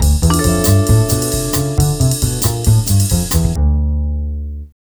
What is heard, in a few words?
TV Suspense Full D, Lounge; Zero-G Kocktail Kollection; Construction Kitz (Lounge); TV Suspense Mixes 135